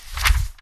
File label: turn the paper; 本や新聞、チラシなど、ペラッと紙をめくる効果音。; リアル 動作 地味 日常 生活